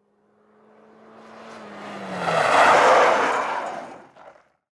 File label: suzuki arrive and brake